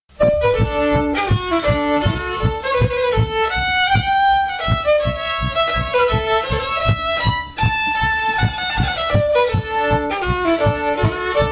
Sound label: Country Waltz in D